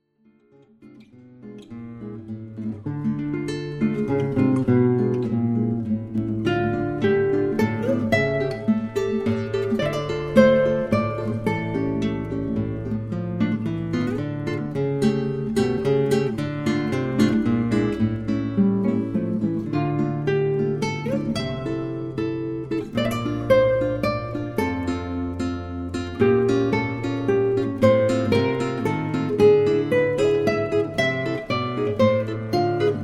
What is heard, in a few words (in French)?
duet de guitarres; cordoba